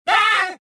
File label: pushed, rodian